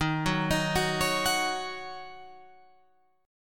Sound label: D sharp Minor 7th, Guitar, Open F; arpeggiated